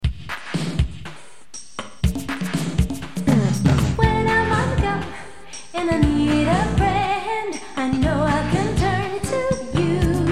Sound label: Pop Full Vocal; WCCO AM